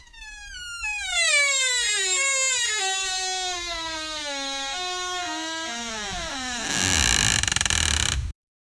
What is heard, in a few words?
door creaking open in old haunted house